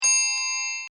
Clock Mantle, Half Hour; Clocks (Sound Effects:Machines)

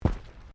Footsteps Boots Walking Track Sandy Step; Footstep Foley; Human

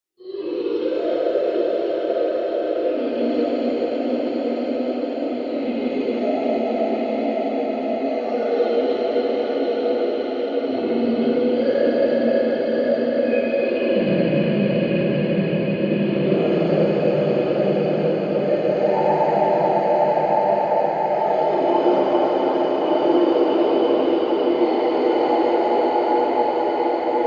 Drone Unearthly Motion; sound design; Drone Sound Effects

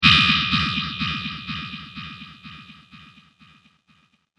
Aerial Sf Blades, Spacecraft; science fiction sound effect

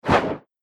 Swoosh Cloak; sound design

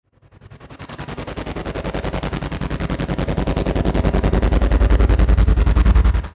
CRESCENDO CHOP, Crescendo Chopper Dark; Production Element Imaging Element Accent Transition

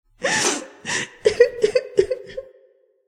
Woman Crying Stairwell Sobs; human

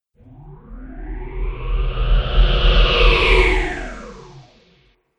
Trailer Hit Wind Phaser Swoosh; Trailer Sound Effects

